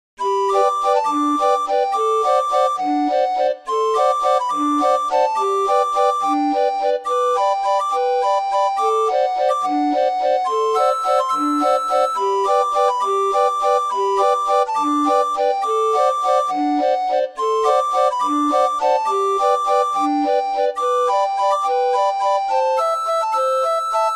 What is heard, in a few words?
My queen, orgue barbarie